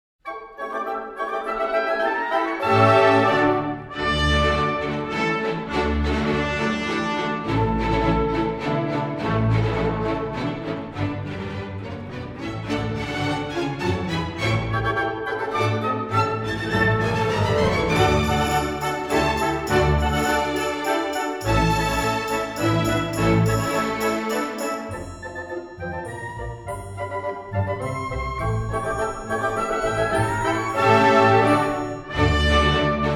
Grands Battements, Polonaise

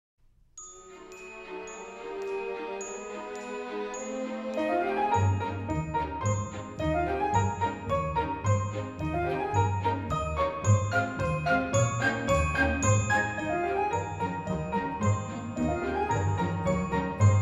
Turns, exam music

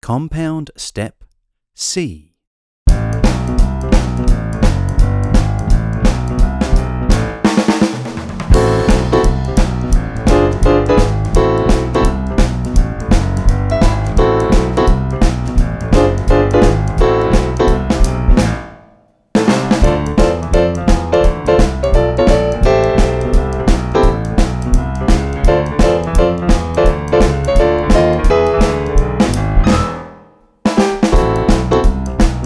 Boogie

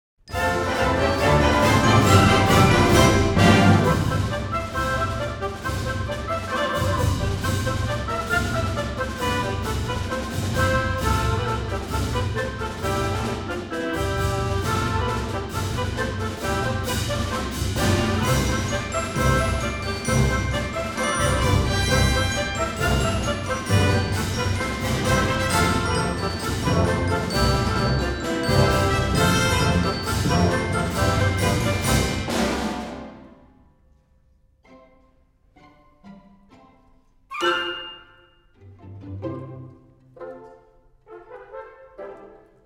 Battements Tendus, exam music

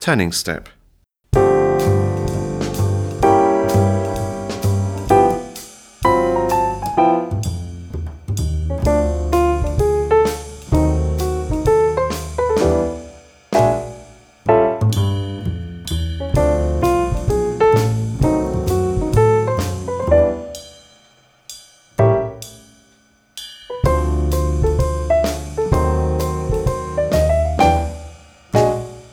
Turning Step

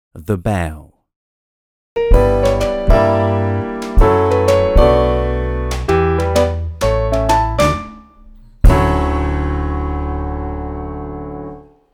The Bow, exam music

